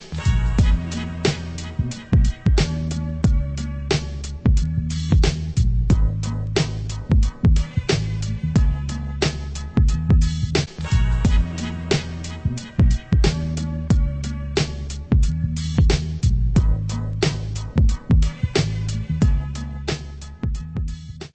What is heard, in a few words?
piano grand